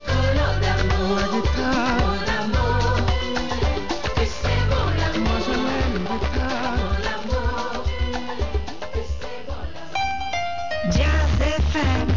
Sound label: Jazz